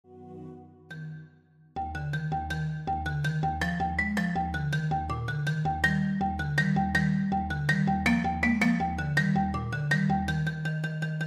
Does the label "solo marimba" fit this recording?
Yes